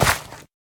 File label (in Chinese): 可疑的沙砾踩踏声